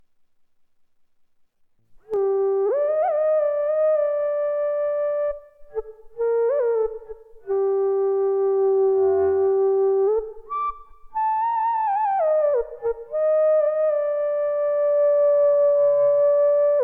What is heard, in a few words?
Zen flute sample